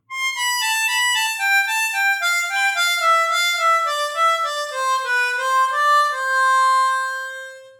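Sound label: Triplets Descending, harmonica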